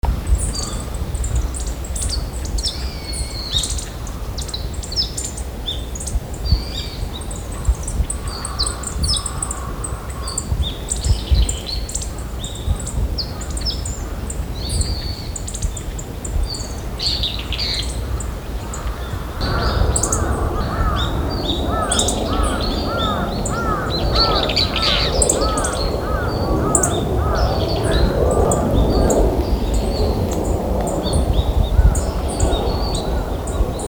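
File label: miyamahojiro, yellow-throated bunting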